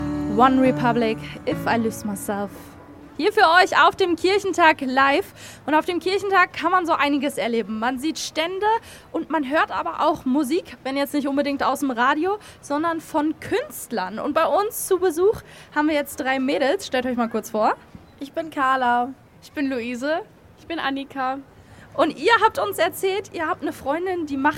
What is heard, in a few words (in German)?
musik werbung; Kirchentag live am 03 Mai 2025; Kinder- und Jugendradio